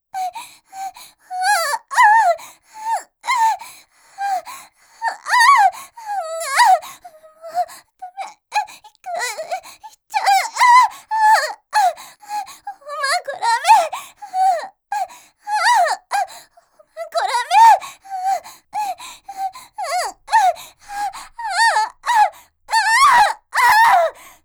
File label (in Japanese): 絶頂激しい